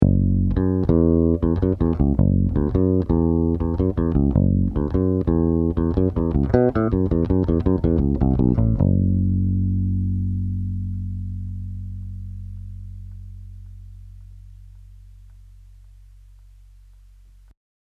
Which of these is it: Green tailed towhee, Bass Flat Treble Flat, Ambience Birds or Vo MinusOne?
Bass Flat Treble Flat